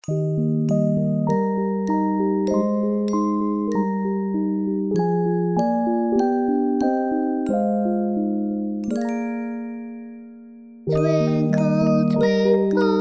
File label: twinkle